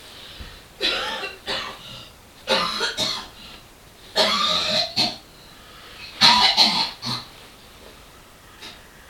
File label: Asthma